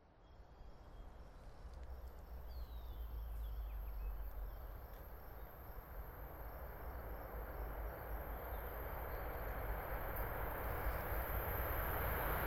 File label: TRAINS AMTRAK